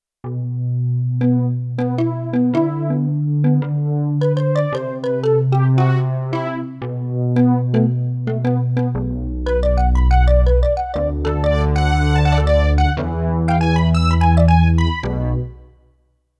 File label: Bali Dancer